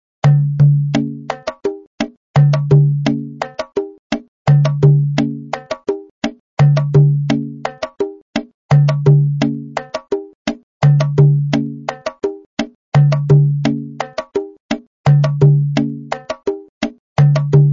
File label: yakota, batadrums; rhythms